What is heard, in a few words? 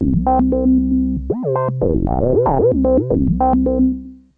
SH101; SYNTH AND ELECTRONIC